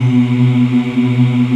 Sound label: VOX AFMM, VOX Afro Mms, Africa